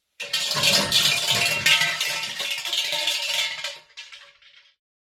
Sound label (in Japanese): 本坪鈴